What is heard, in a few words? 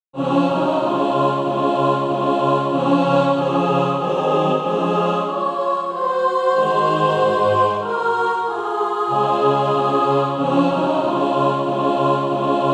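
mixed, SATB, Practise Music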